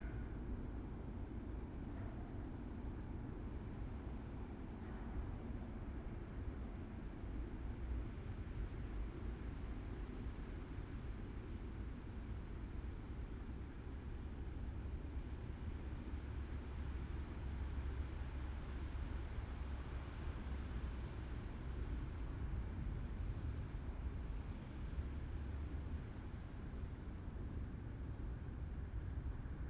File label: yamanakako sound